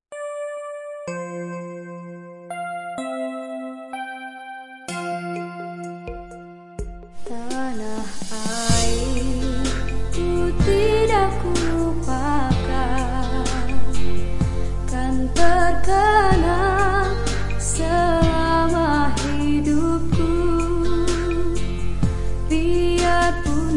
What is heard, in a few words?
tanah airku indonesia cover